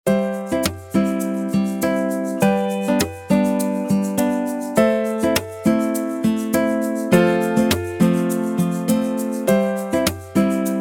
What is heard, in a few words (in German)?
Lied Aggregatzustaende kurz Instrumental